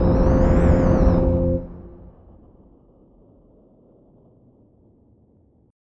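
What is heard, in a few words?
STONE DOOR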